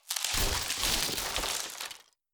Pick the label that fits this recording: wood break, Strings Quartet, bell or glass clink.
wood break